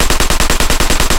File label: loop l8a1 origin; guns tanks; War Thunder